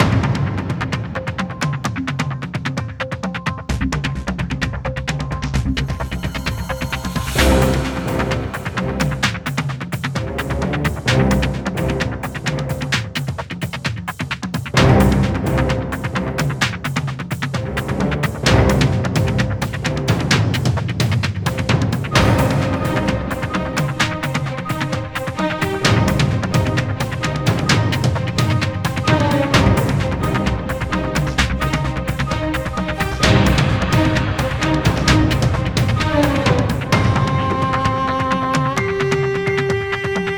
Battle Dramatic Damage LOOP

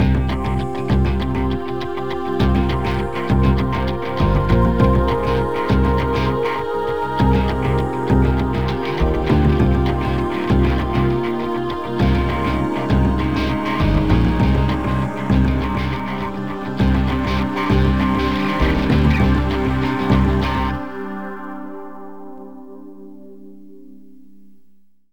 Groove Vox

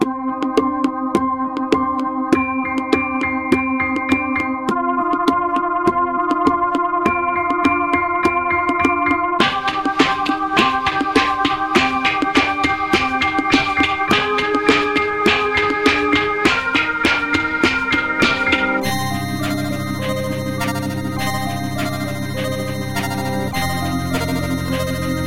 final chase; God of Blades; android ios macos mobile windows

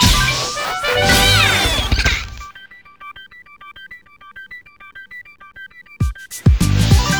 Level Clear; Heroki

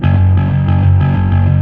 Fuzz F sharp 2

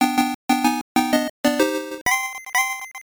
Baseball Quiz Poor Rating; Mezase Pro Yakyuu Pico gamerip 1995

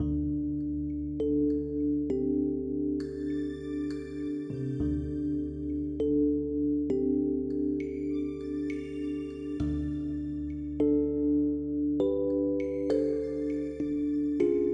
Music NY ambient

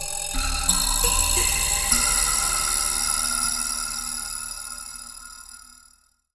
Lo Vibraslap

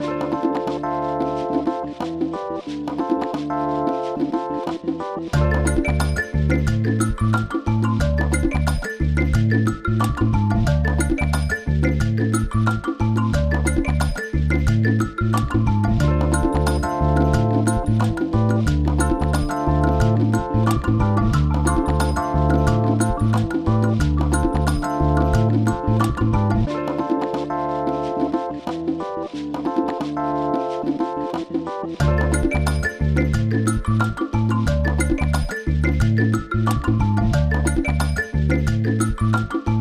menu; Jewel Keepers Easter Island; PSP

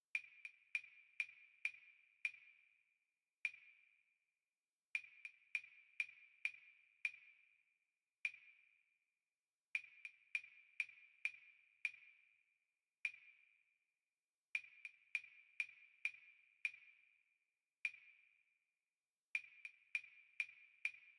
Perc; Toki Tori 2

